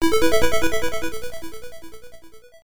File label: Area Mid Boss Destroyed; Zanac EX; MSX2